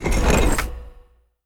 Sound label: sturdy open; sfx throwdown; Animation Throwdown